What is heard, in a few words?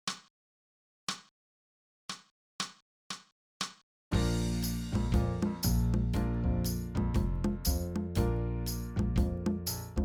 12 Bar Blues Bb